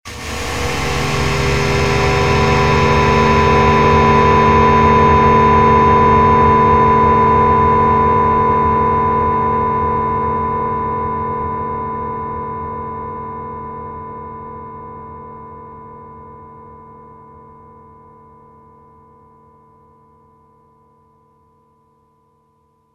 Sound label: Feedback Swell; Best Of N2O Library; Drones & Foundations